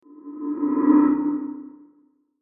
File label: Whoosh Dark Airy; Blastwave